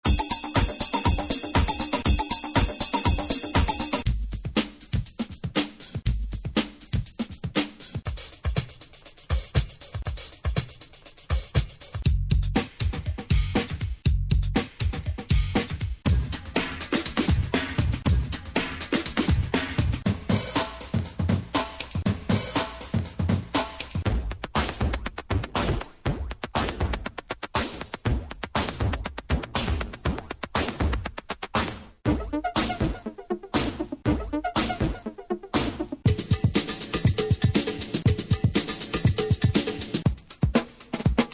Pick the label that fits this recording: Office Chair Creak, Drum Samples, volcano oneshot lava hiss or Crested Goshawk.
Drum Samples